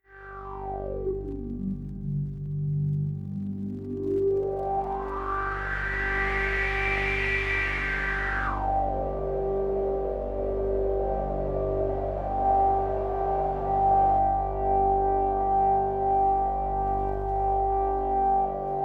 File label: G drone